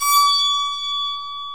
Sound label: BASIC ENSMBL; OMI - Universe of Sounds